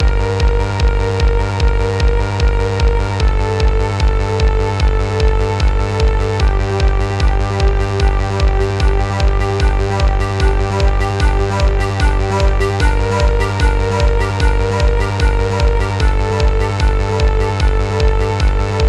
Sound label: Jukebox Trance; Minit